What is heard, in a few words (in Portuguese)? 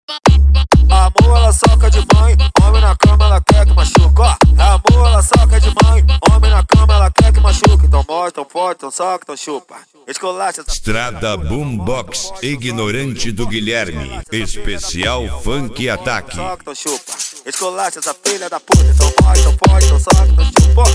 esp funk atack